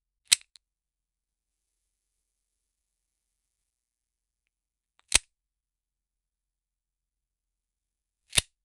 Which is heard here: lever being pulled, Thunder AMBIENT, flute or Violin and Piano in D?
lever being pulled